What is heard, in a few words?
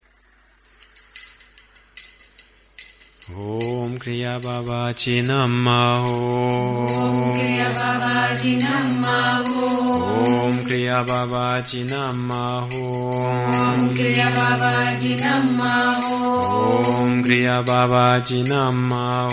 kriya babaji mantra chanting